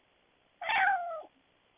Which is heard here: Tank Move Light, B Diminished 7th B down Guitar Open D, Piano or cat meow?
cat meow